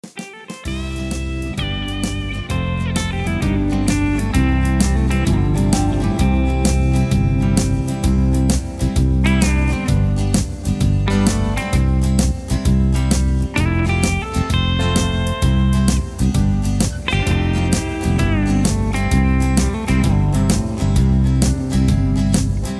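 Instrumental without Leads without background vocals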